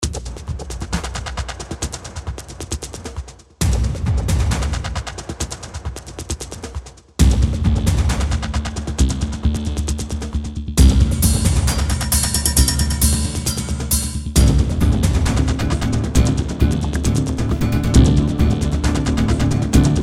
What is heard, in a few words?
rytmikbeats